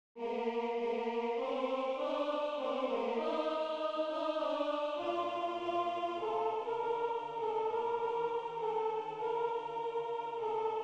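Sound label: mend alto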